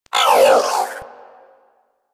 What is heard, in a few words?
Vocal Pain, Pets; Warframe; Zanuka; Zanuka Companion A